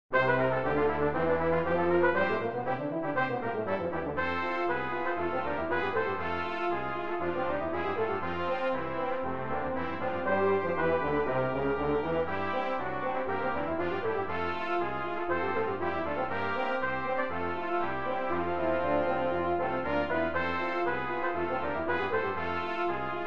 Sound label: Thunder Bay March; Brass Quintet Audio